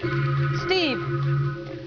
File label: ringin